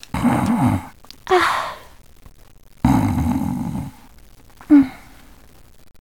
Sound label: Snore